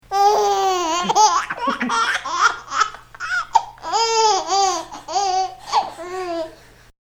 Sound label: The Boy Laughing